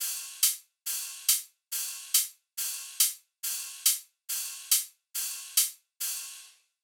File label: Riddim Drum Loop Top (140)